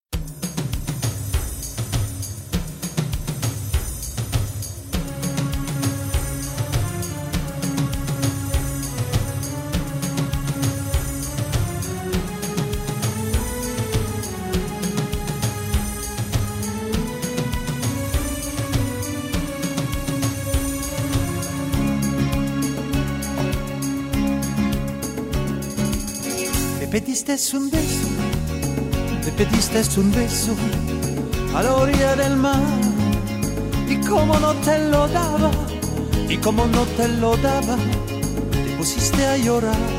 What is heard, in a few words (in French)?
medley rumba